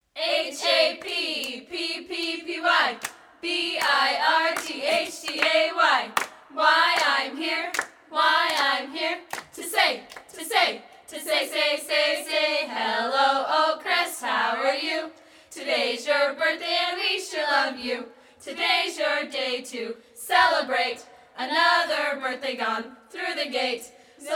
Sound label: Happy Birthday Song